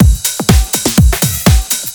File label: House drumloop squashed